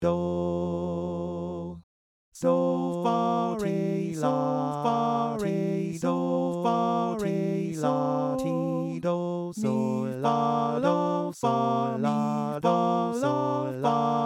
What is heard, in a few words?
Valse; Satie